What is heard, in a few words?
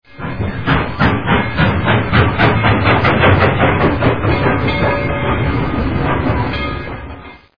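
sound of stopping train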